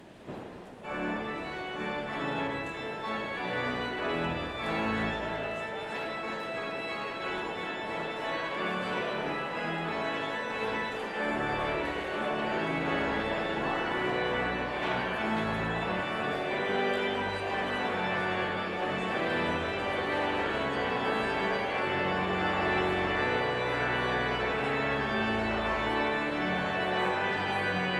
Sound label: Postlude